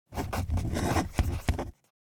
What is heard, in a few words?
Writing on paper handwriting pen short